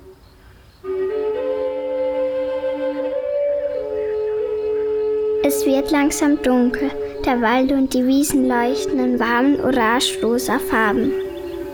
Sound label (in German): Pferde